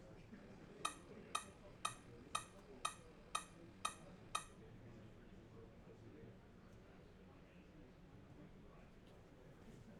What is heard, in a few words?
audio beacon